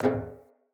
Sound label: Walk Metal, Footsteps; Characters; Enemy; Mockeries; Mockery